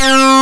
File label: SYNC WAVE; SAMPLE WAVE MIXING 1